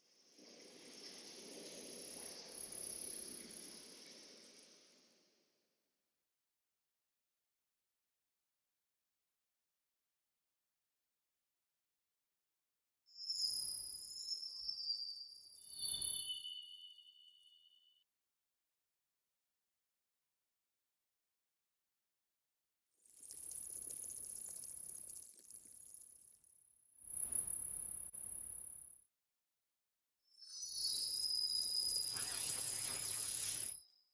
Firelight Bugs

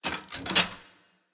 Cable Success; Puzzle

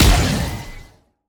Attack Jump; bloom minigame

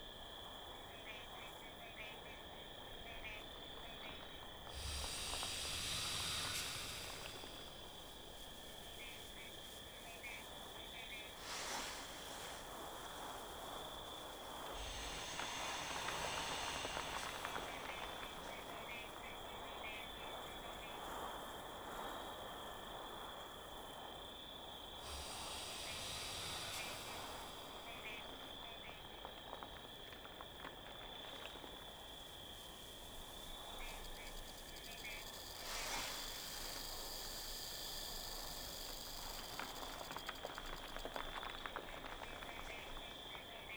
amb lair; spirit blossom

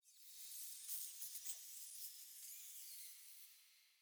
Mice

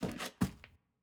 Footstep Dirt Rotate

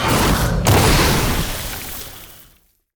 attack slam cast poison; bloom minigame

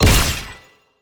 attack dash heavy; bloom minigame

